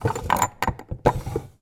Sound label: dresser drawer wooden small open close items inside